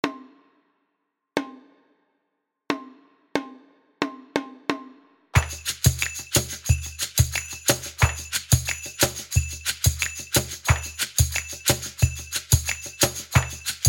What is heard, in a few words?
Percussion Loop 90bpm